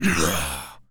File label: Male Pain, Zombies; zps; Male1